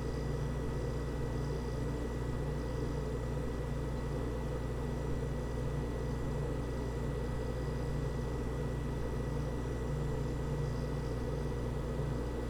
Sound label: fridge loop